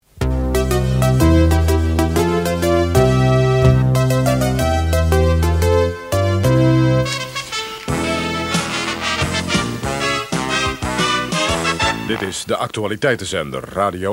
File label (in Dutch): Radiojingles Compilatie; STER